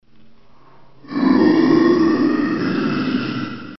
zombie; dod

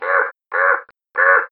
crow idle; tf; pets

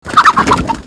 chicken fly long; tf2pets; csgo chicken

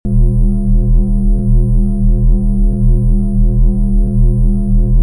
shock absorber use

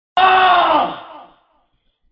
scream

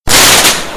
fireworks spark; tf

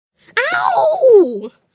ouch; hl2dm

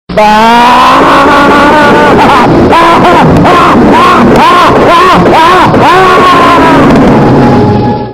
aaahaha

